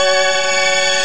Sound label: string